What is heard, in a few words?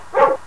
dog; rover league